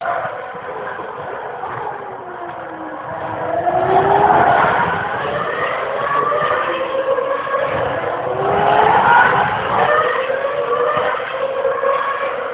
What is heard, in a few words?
F1 Spa Francorchamps